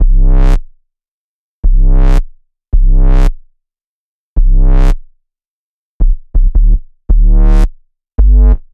Gossip Bass, downbeat electronica; бас

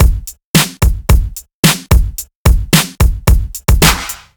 Pop Set, 110 bpm

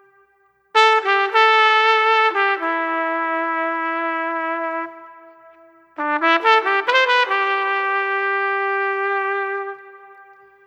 Trumpet Solo; труба

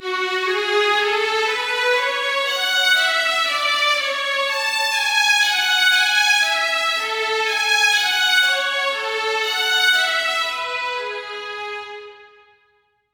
Violins, 120bpm

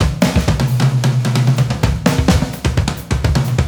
Loop B, ударные и барабаны latin; латино

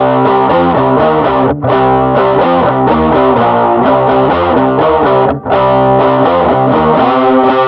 Rollin Rock; Big Beat

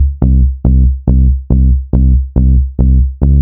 Blap, hard style

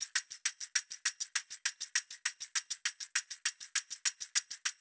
Tambourine; перкуссия

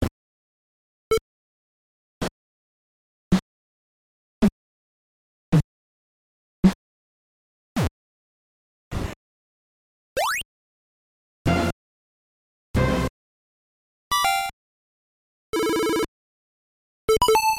bomberman ii sfx

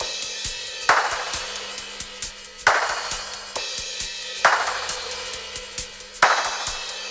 Stereo Kit C

